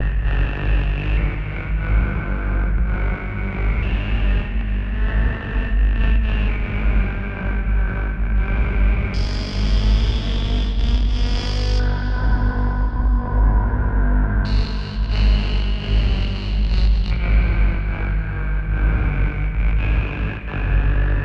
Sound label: Computer Core Temp Music Loop